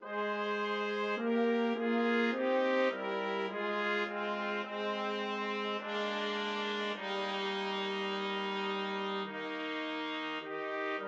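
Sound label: brass trio trumpet trumpet horn; O Perfect Love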